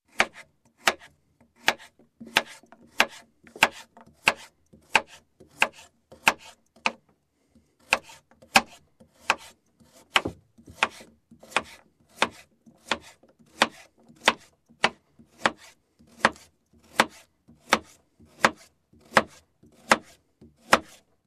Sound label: Chopping Board cutting